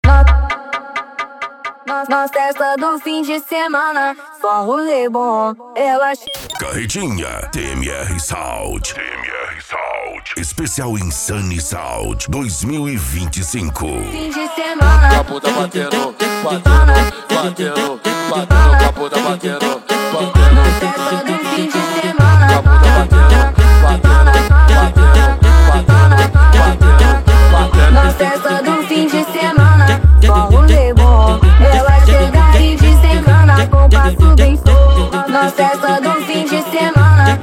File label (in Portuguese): esp insane sound